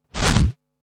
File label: Scratch Fills 140BPM